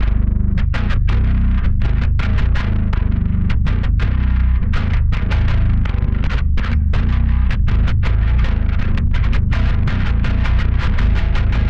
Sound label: Main Riff Bass, modern metalcore